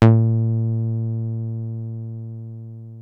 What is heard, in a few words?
303 A2, TB 303